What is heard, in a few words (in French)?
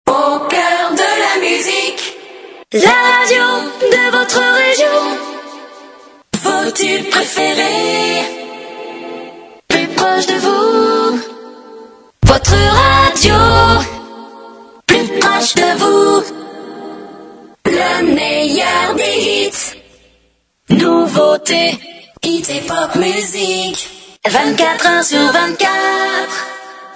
JINGLES CHANTES A CAPELLA TOUS STYLES